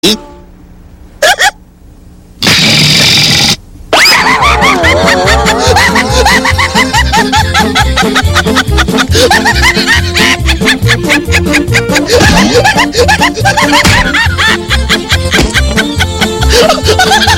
Hiena Rindo

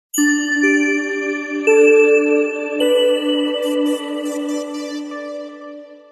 Som de Introdução

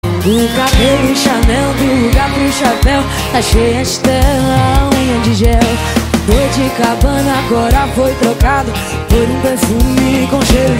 Boiadeira

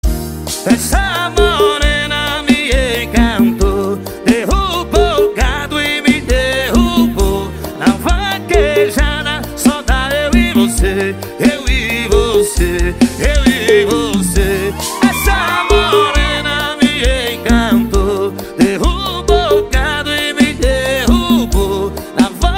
Toque Dez Vaqueira

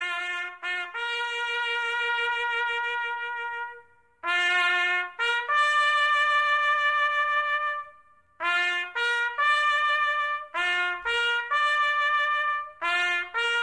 Alvorada Militar

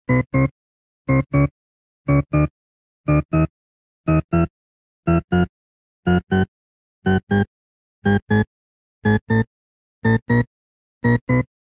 Ilusão auditiva; toque

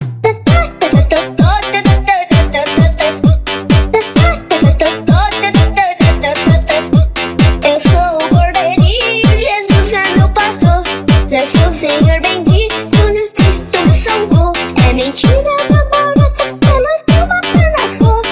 FUNK

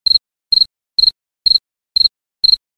Grilo SMS

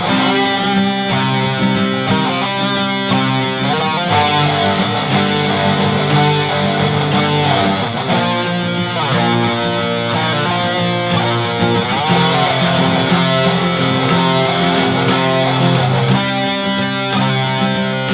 Guitarra Electro Acústica

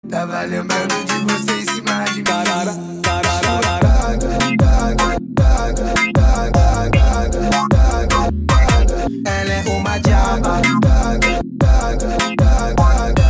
Remix Brega Funk

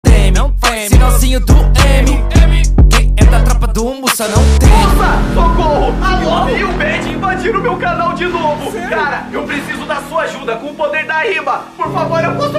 Batalha de Rap

